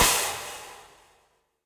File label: Crash FX18o16 Dark Plate Verb, Cymbal; Drums; Drum Hits; Standard Hits; Ableton; Factory Packs; Session Drums Club